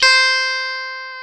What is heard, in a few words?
guitar, e-guitars